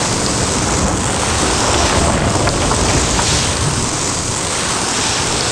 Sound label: roll sand; terrains; Tony Hawk's Project 8; XBOX